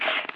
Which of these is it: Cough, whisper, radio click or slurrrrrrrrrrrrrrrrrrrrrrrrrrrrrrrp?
radio click